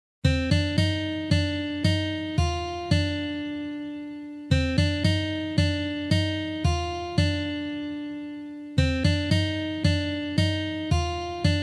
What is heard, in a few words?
Intro Slow Tempo